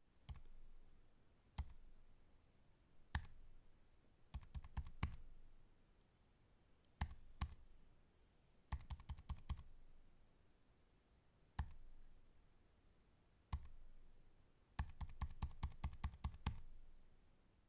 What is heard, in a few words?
slow typing